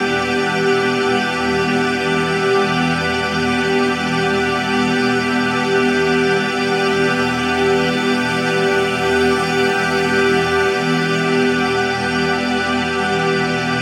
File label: pad loop stasis 150 Emin; Virtual Riot